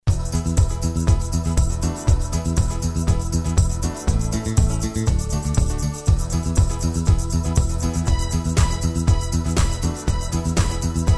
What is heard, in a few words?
Guitar; Gitaarboek; Guitar and Co